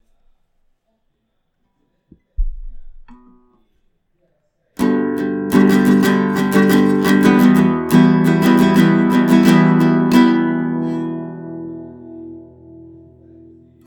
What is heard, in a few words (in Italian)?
chitarra riduzione rumore; Trust Gaming GXT 252 Emita Plus